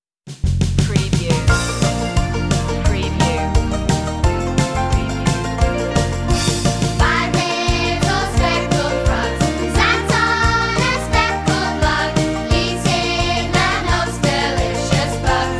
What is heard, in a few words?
five little speckled frogs